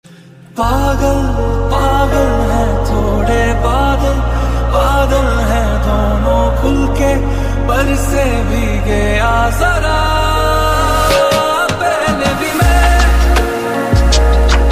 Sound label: Lofi